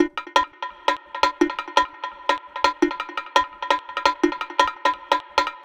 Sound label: Percussion, percussion loops; KJ Sawka - Drum n Bass & Beyond; Drum Tool Box